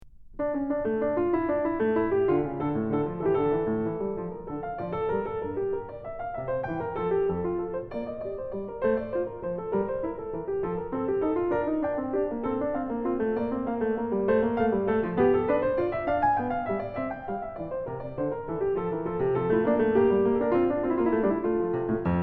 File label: Short Prelude In D Minor; Magrath Book 07; BWV 935